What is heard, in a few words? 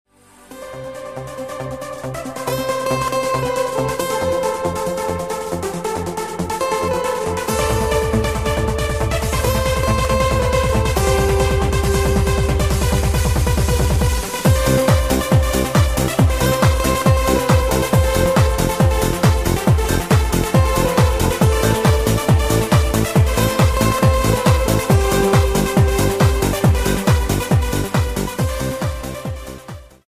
ibiza trance remix